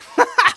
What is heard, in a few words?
laugh short; Scout